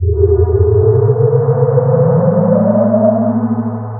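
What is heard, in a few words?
repulsor lift loop; Star Wars Clone Wars; XBOX; Xbox Live Effects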